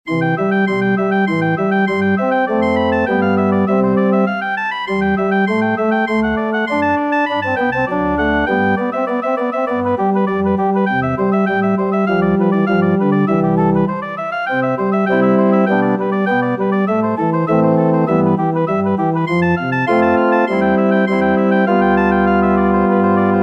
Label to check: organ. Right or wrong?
Right